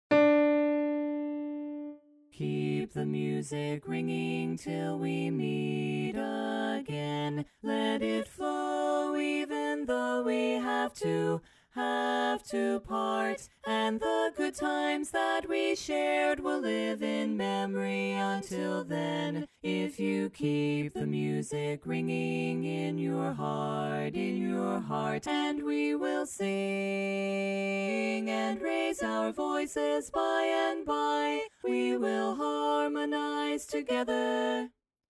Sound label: Lead Missing